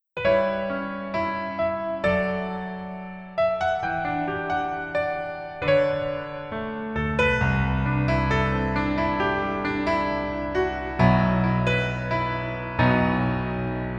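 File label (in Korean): Piano version 멜로디MR 남자키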